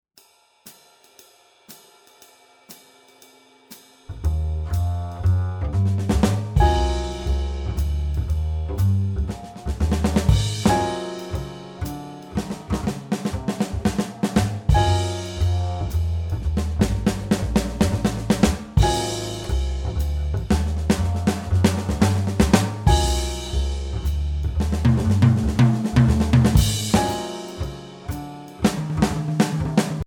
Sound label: Jazz Sixteenth Swing